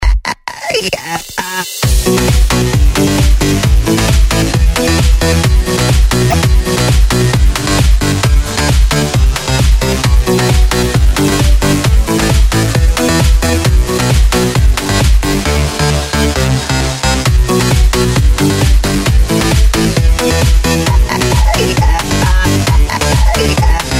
scratch

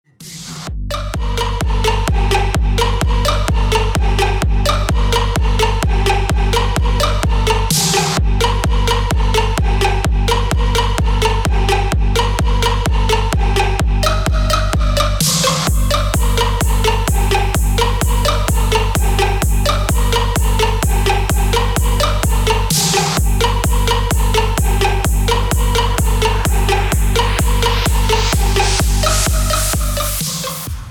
strings

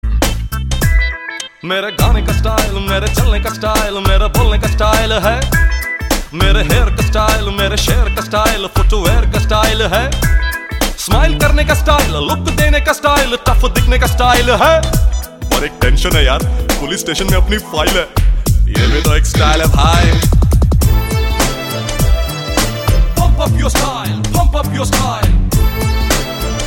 cool ringtone

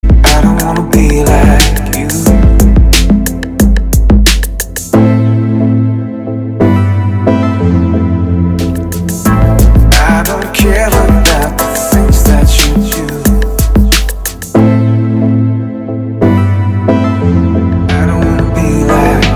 relax music

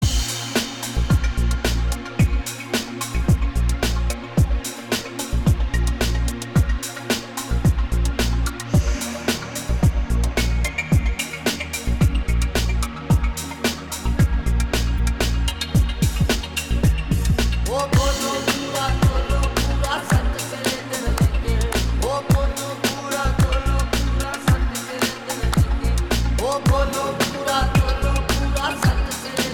ringtone music

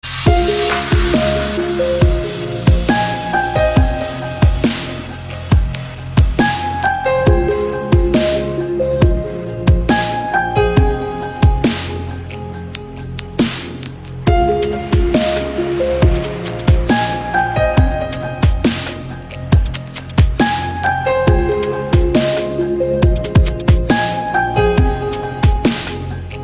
dubstep remix

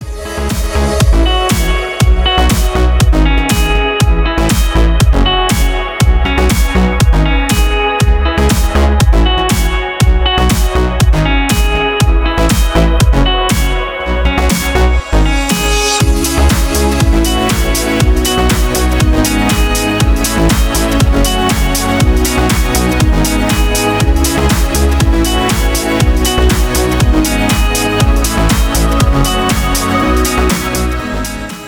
ringtone melodic deep

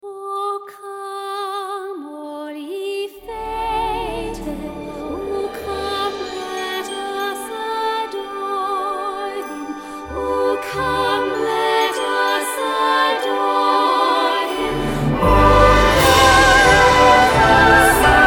celtic christmas

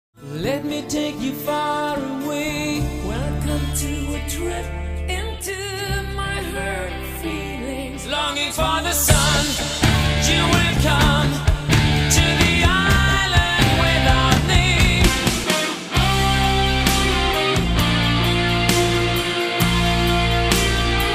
rock mix

